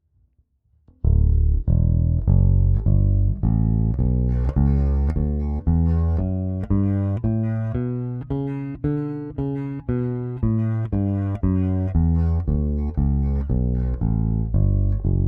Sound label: Aguilar Super Double vs. Nordstrand Big Blade neck PU passiv